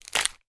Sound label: horror bone arm leg break; sound effects one